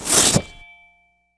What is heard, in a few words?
axe out; The Elder Scrolls III - Morrowind; Morrowind GOTY GOG